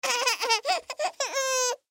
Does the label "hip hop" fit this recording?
No